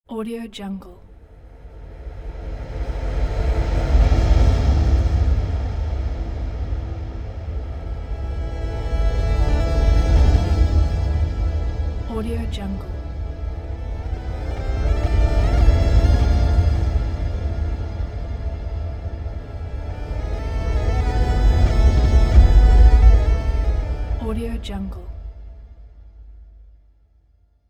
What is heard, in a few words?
arabic epic transition